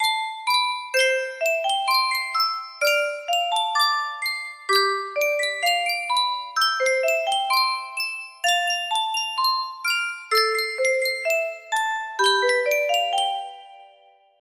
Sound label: music box; sankyo